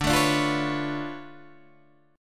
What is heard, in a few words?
D Minor Major 13th